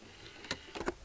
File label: disc clamp BDR-S11J-BK